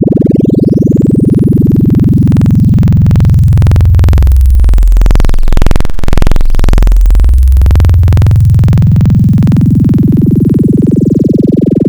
Fm Sweep, Serum Wavetables; Synthetic's Serum Collection; Cymatics - Nuclear for Xfer Serum Wavetables

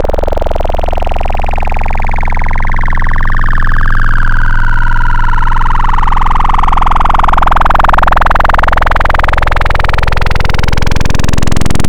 Digi Warp, Xfer Serum Wavetables; Synthetic's Serum Collection; Cymatics - Nuclear for Xfer Serum Wavetables

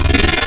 Light Metal, Spectral; Synthetic's Serum Collection

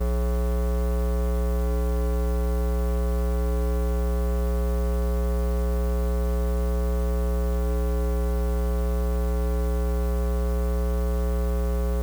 Bathroom Light Switch; Electronics Of My Great Grandma's House

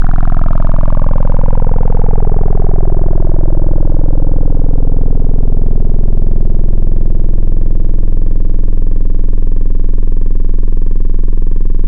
Digital Acid Saw, Wavetables; Synthetic's Serum Collection; ESW Core Wavetables; Echo Sound Works Core Tables

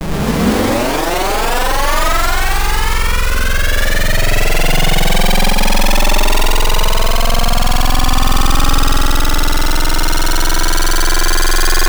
Digital Fuzz Screech; Synthetic's Serum Collection; Echo Sound Works Core Tables

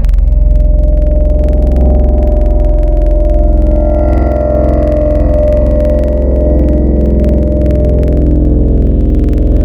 sitar; Synthetic's Serum Collection; Chill Trap Tables

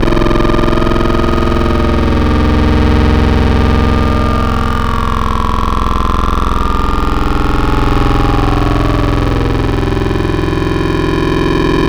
Gruff Throat; Digital; Synthetic's Serum Collection; Echo Sound Works Core Tables

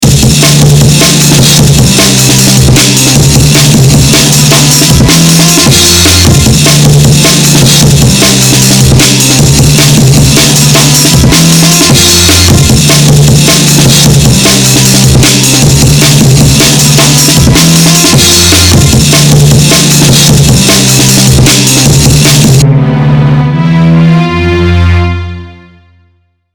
emotional drum stick

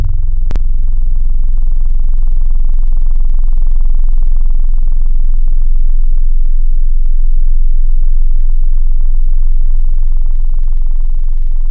organic string; wavetables; Ambitronic

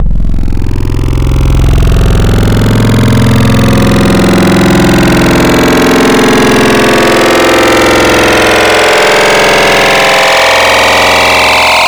Scream Machine; Synthetic's Serum Collection; Cymatics - 100k Serum Wavetables

